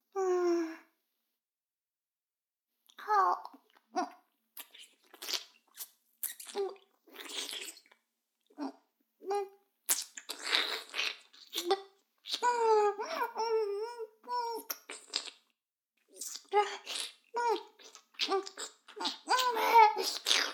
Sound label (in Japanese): フェラ音声; 逆時間停止されて